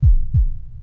Heart Beat; Freestyle

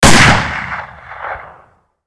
Lever Action Rifle Fire; Weapons

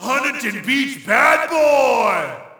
Shout, audience; UFC Tapout 2

